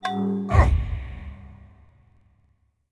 Collateral; Civ4 warlords

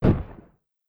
helicopter machine gun single distant; Rust

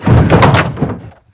hit cockpit metal

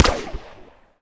claw shoot

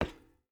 footstep boots metal walk; Rust

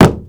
land wood, footsteps; Call of Duty Modern Warfare

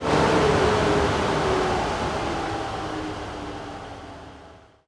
engines; World of Tanks

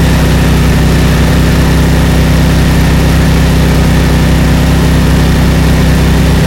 tiger diesel engine rpm load, engines tanks; War Thunder

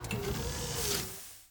ship engines; World Of Warships

